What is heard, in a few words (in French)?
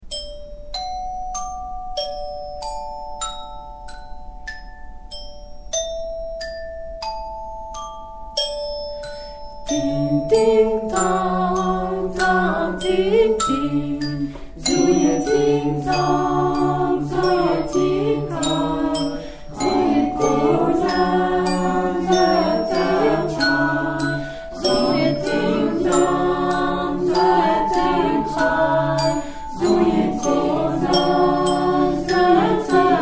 Canon, Chants; Ting Ting Tang; Royaumont